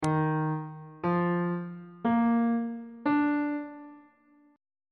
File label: d sharp major 7th suspended 2nd chord intervals on bass clef